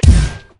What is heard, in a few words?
elec fire